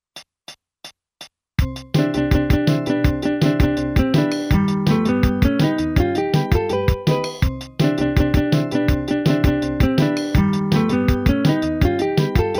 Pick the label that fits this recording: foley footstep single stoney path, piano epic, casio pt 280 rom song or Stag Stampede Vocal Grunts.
casio pt 280 rom song